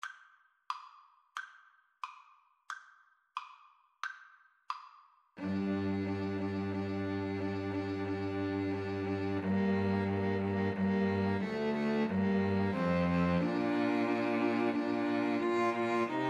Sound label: 2 Violins Cello; capriol pavane